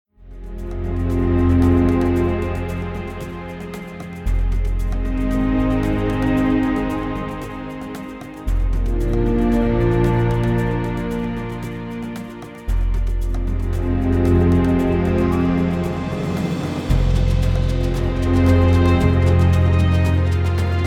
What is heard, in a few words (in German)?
Big Drums later Ohne ersten Horn Part